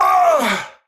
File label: Death, Battle; Warframe; Tau; Dax; Knight